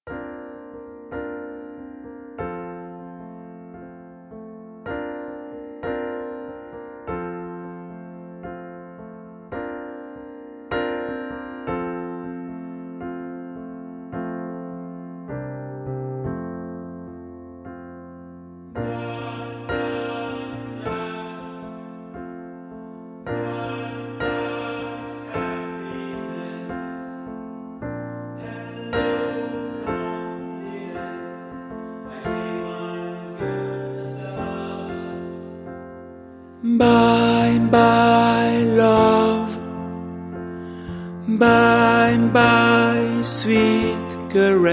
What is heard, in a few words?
BARYTON